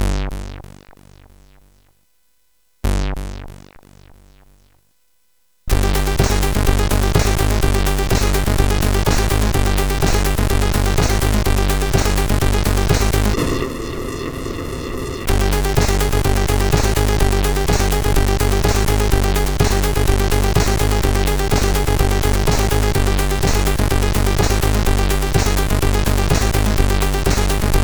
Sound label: Techno Tune, CSG8580R5